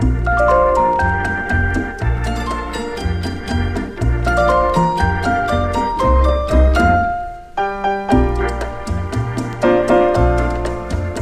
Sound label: CARNIVAL IN PERCUSSION